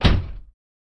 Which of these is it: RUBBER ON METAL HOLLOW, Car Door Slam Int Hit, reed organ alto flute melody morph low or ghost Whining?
Car Door Slam Int Hit